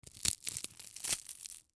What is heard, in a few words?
Cracker Break In Half; Foley Cracker Break In Half Food; Blastwave